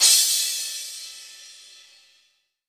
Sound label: big room crash cymbal sample c sharp key, Royality free crash single shot tuned to the C# not